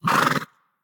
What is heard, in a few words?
mvt pet vocal; smite; lancelot